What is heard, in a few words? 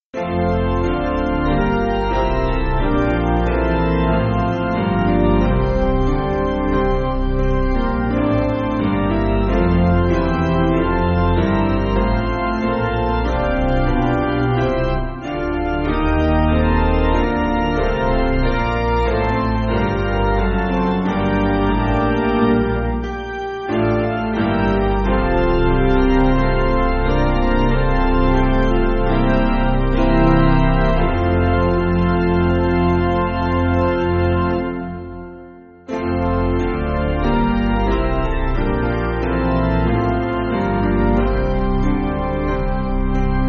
Piano